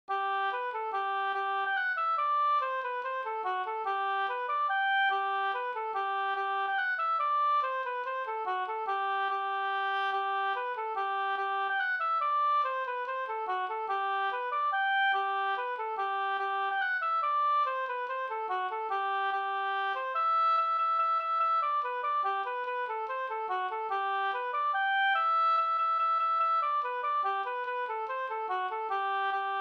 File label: mazurka; Fars mazurka